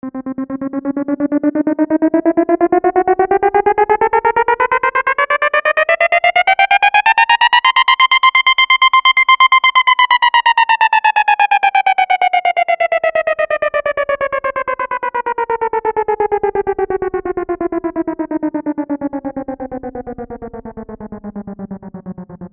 Broken Siren

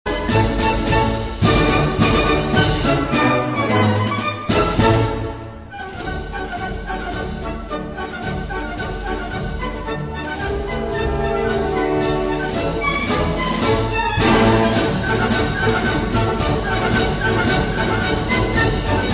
Radezky March

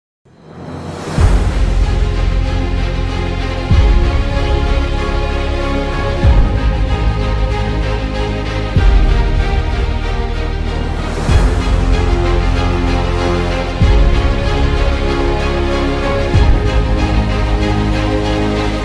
Cinematic Motivational

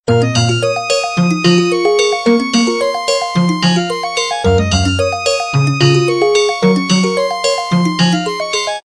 Harmonia Sms

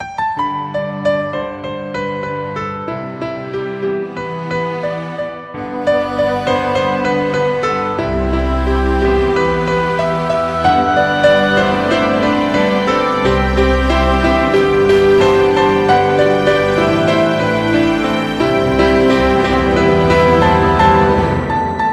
Lullaby

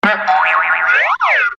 Cartoon Sound Effect

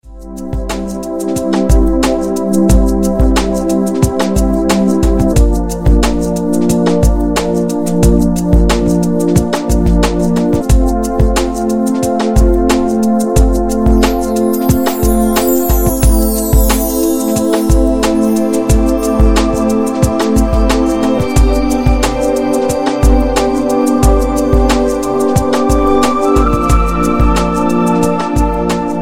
Relax Music

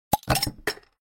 Plopp SMS

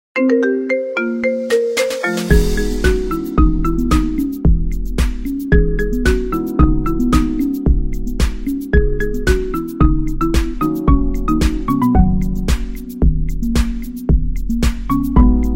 Marimba

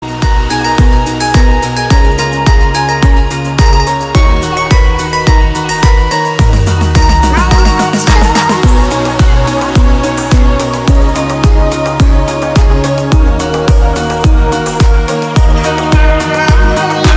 Electro Bells

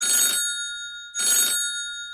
Classic Bell

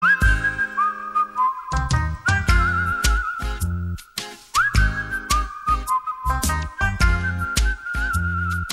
Churalo Na Pfeife

